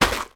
gerbil pet food bag drop on carpet floor; sound effects one

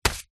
seeds packet black chia set down on wood counter; food

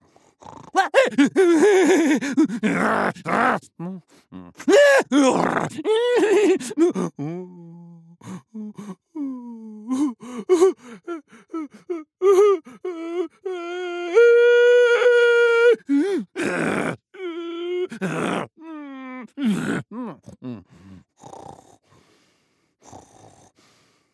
FUN MONSTER Swindly foolish sequence

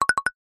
cartoon blink flutter shake

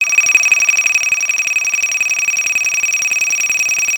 science fiction data read; zapsplat

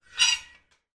industrial metal gate lever movement

